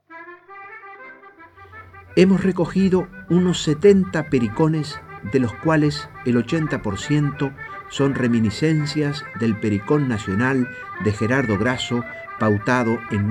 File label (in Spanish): pericon; Lauro Ayestarán